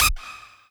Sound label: lead